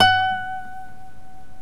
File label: NYLON GUITAR